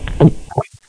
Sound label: blurp